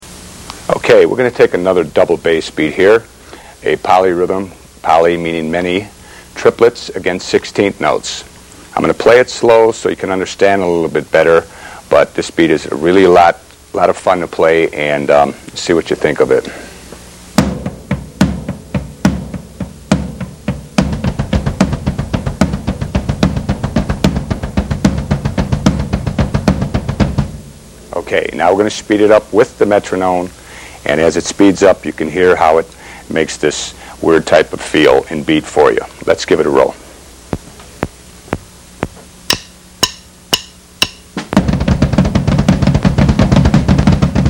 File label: 16 against triplets, drums